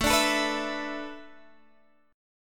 A Suspended 2nd Suspended 4th A Guitar Half Step Down, chord